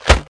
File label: bod strk, bugs; Starship Troopers